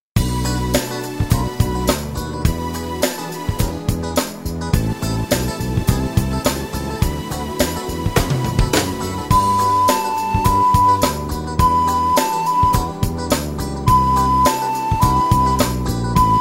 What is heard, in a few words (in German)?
blockflötentaxi